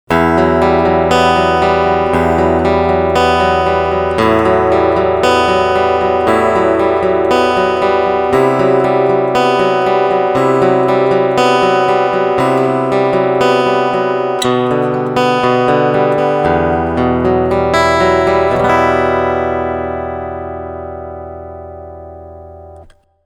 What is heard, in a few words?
Picking CoolTube OFF EQ